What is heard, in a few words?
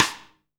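Snare Drum Rim; Best Service Gigapack 2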